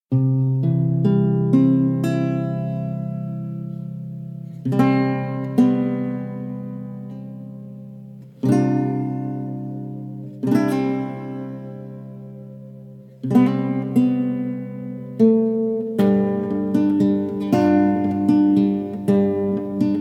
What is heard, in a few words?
Healing